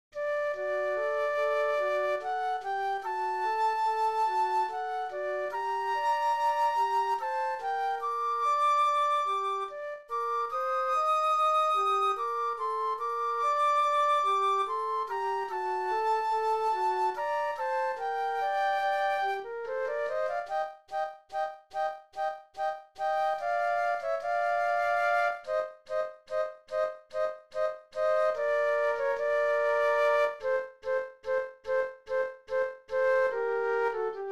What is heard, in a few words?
Flute Duet; classical